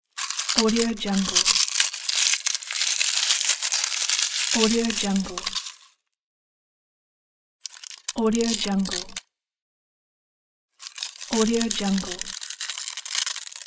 peel

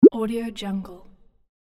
bloop

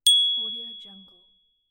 ding; dedge studio

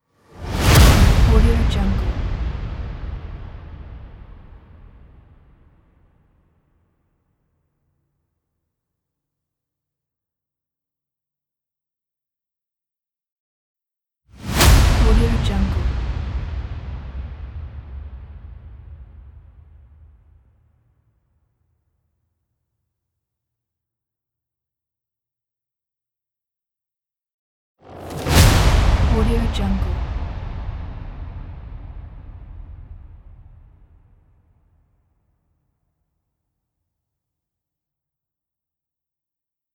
trailer impacts; lunamycreations

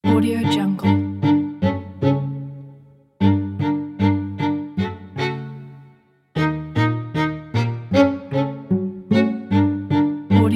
quartet comedy

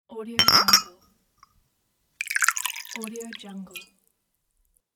pouring vodka in glass

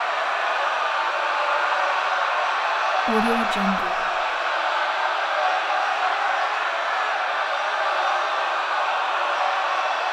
crowd panic

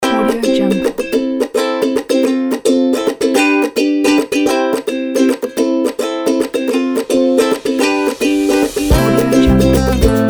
good mood

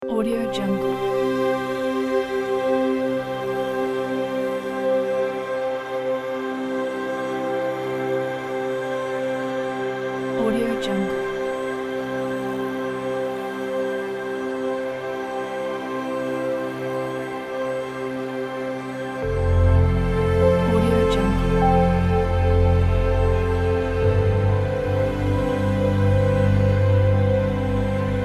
inspirational piano; redemption; jayfonicmusic

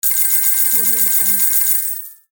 jackpot

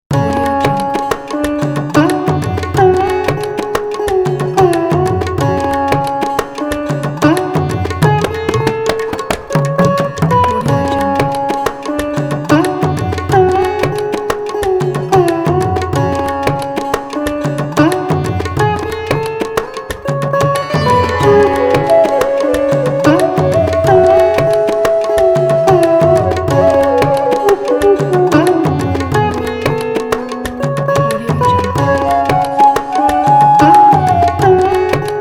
indian lounge loop

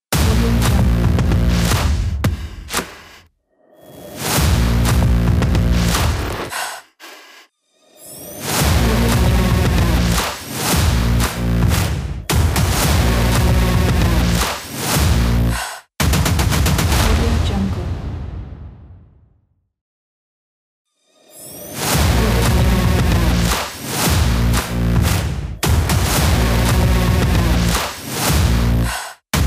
hybrid action trailer logo

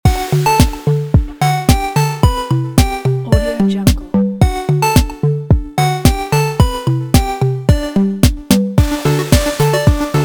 8bit game show intro

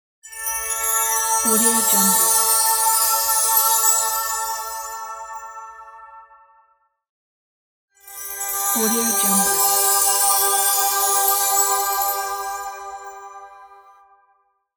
magic wand

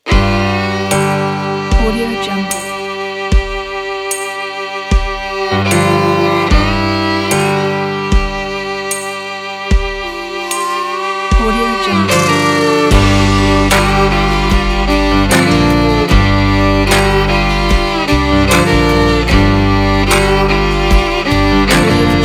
western country cinematic violin; violinworld

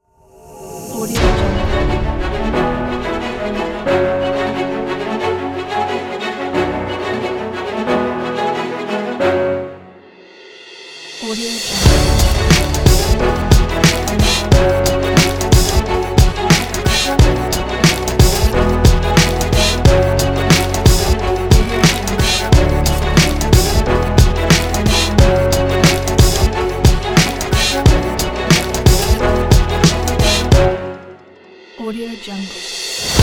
inspiring epic sport

